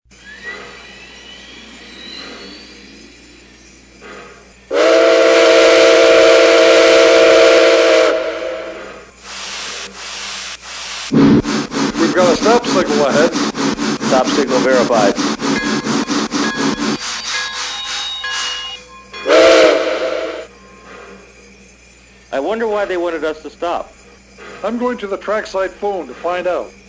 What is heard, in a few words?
Berkshire